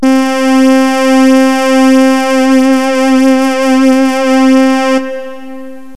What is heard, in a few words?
lead soft; Terra Sound Library; construction set